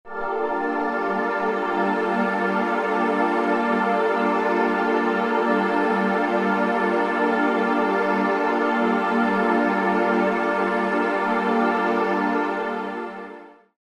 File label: omni glitched, Pads; Crowdsourced Sample Pack; TRUTH-HZ